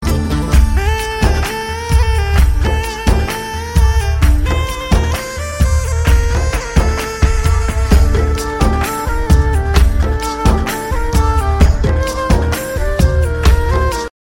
Arabic

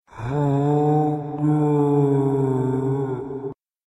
Zombie Hunger

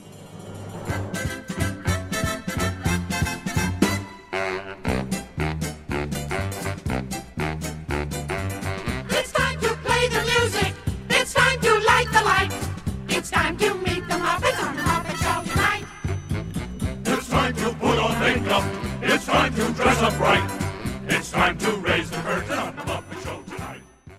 Cartoon Tone

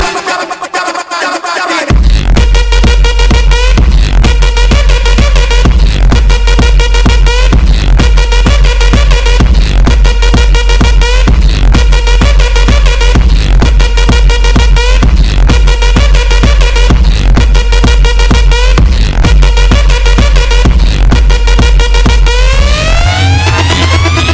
Horns

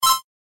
Whats App Pop

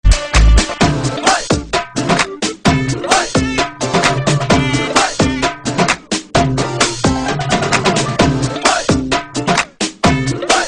Gal Bhangra

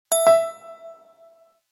Ping; Iphone

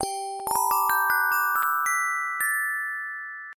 samsung poly

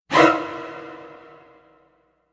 Scare Chord

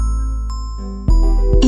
Incoming Msg; meloboom

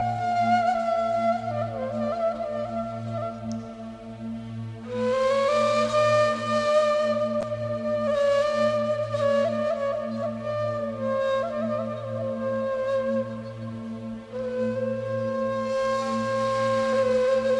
Ney Sound

